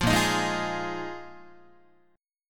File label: A sharp 7th Suspended 4th, Guitar; A#7sus4; strummed; 8,6,6,6,9,7